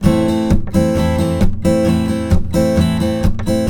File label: Blues Guitar Samples, RIFF; ILIO; Fretworks